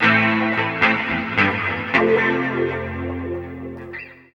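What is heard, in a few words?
guitar, left; East West - Phat & Phunky CD2; 90's Sample CDs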